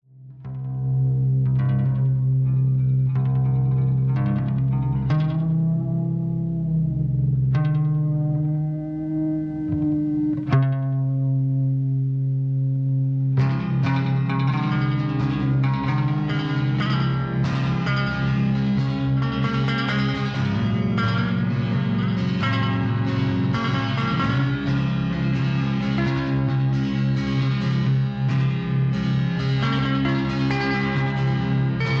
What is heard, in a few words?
Brand new Piano guitar